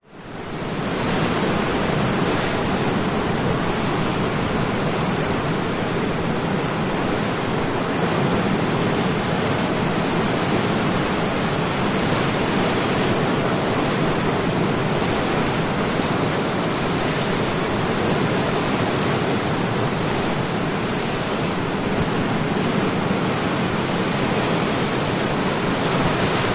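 nature ocean waves rough distant wash against rocks top of headland cliff insects; zapsplat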